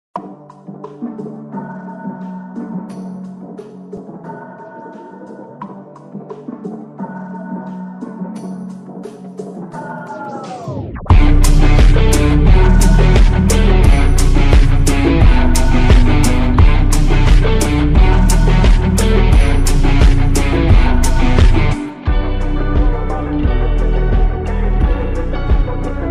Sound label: phonk rock